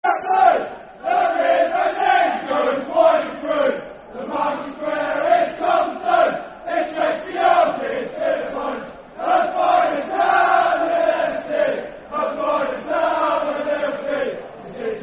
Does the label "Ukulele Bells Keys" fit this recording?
No